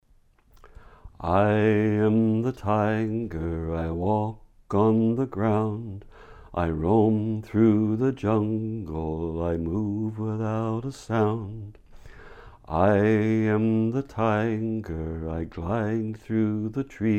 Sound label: tiger singing